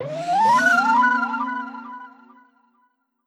Satyr Flute

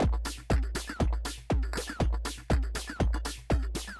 Speaker-Mic Glitchhouse Loop

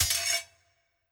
Slave Fall

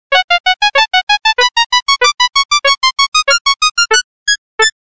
Ring Tone (Mono); Smeshariki: Kaleidoscope of Games

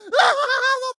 Water Splasher

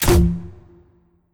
Pistol Launch; Pistol Hero Helmet

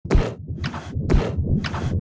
Walk

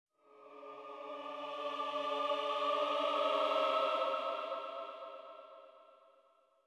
Choir

